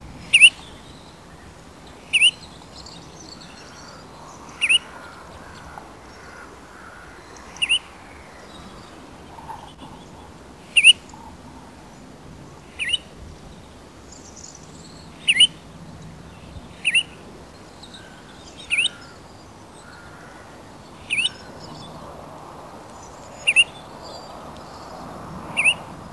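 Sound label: goldfinch voice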